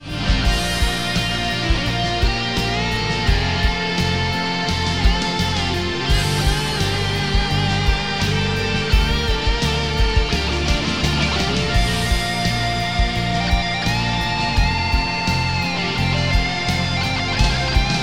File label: compressed